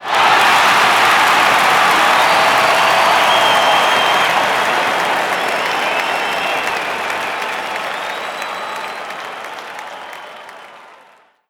Cheers; Nicktoons Nitro; arcade

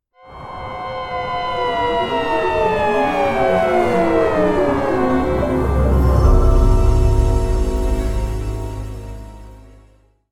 Warp

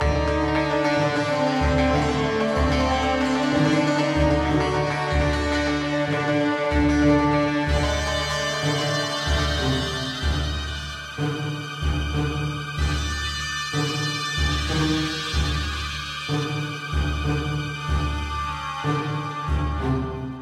Puzzle Loop

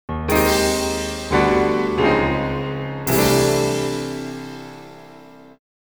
End In Failure Game Over; carnage heart portable original sound track

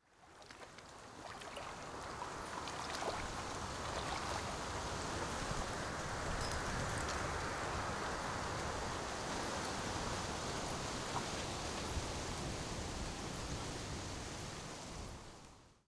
Fjord Ambience; tamarin o.s.t 2020; Lyngna Fjord

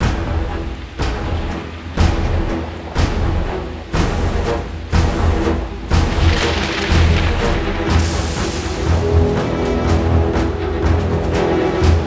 With Storm SFX; Ace Combat X2 Joint Assault; PSP; Spiridus Retreat

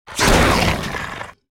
werewolf special up

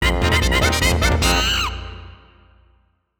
Bonus Win; Donkey Kong 64; Solution

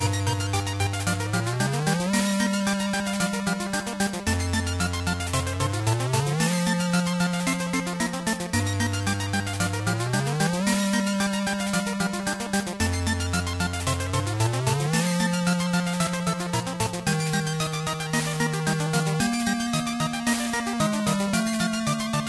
Music; save me mr. tako tasukete tako san switch windows gamerip 2018; World6 Level 2

